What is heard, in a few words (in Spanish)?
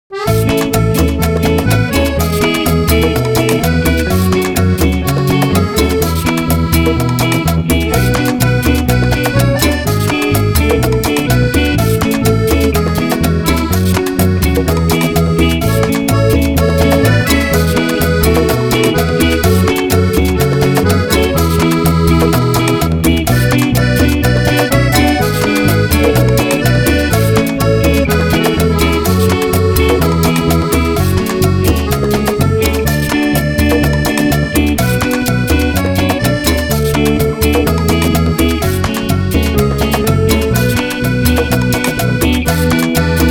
cumbia acordeon